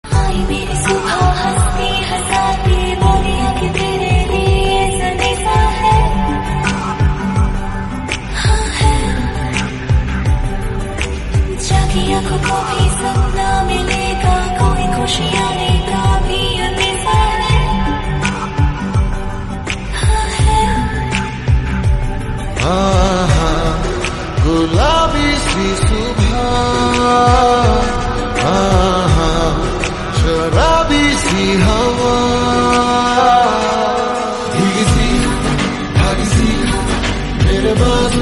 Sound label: lofi flip